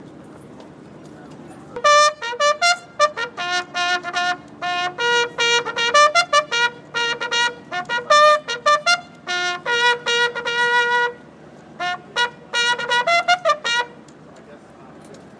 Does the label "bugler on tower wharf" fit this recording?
Yes